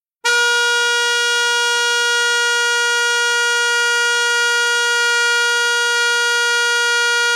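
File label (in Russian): протяжный звук автомобильного гудка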